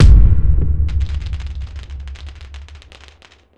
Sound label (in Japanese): 打ち上げ花火爆発; ゆめ2っき